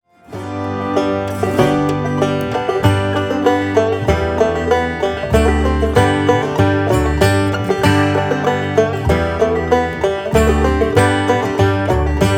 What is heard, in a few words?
banjo